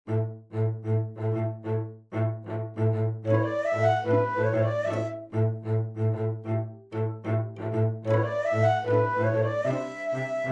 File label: Polyrhythm Trio